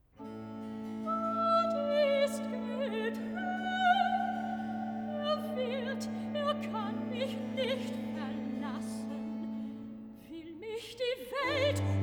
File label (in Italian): Recitativo (Sopran); Cantata, BWV 52 'Falsche Welt, dir trau ich nicht!'; IV. Recitativo (Sopran) 'Gott ist getreu!'; Bach; Winchester; 23rd Sun After Trinity